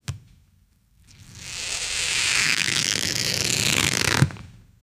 rubber stretching sound

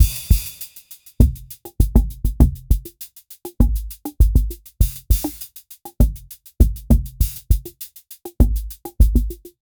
give me a baseline in, funk

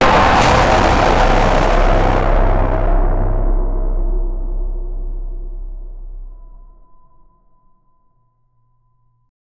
horror metallic clang

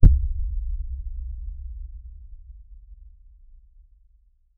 single soft low frequency, abstract

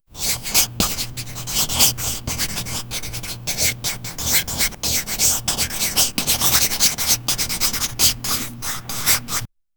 straight line with a, artistic

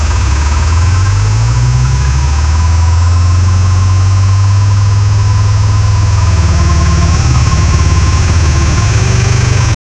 lamborghini aventador sv

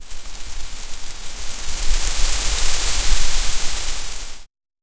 lush and rapid vegetation, plants

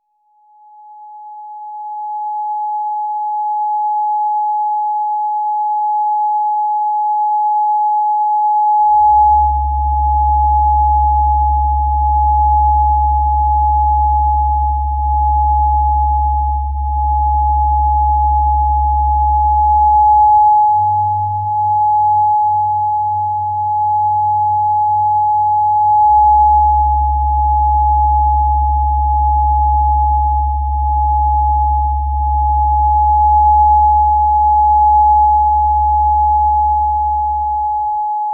long ambient, healing

